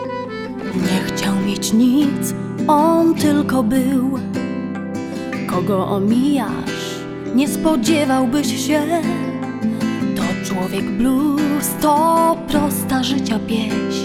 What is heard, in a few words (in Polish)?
Harmonijkarz